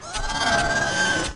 screech, acklay; valkyrie npc pack; fallenlogic

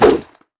wrench swing; melee weapons; tfa starwars melee weapons pack

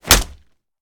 punch gun wall; gmod; arccw uc commons attachments

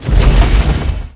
stomp; gmod; lvs star wars; atte

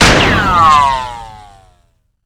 armor rico; gmod; lvs framework

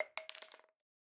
casing 40mm; gmod; arccw uc commons attachments

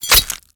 knife stab; tfa starwars melee weapons pack

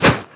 bat heavy, metalbat; melee weapons; tfa starwars melee weapons pack

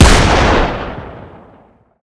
fire; gmod; arccw uc commons attachments; ub12ga

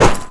pipe lead heavy; melee weapons; tfa starwars melee weapons pack

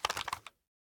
rattle; gmod; arccw uc commons attachments

